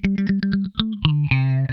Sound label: Funk Guitar; Zero G - Funk Guitar